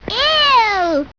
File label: "ewww"